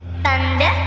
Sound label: Thunder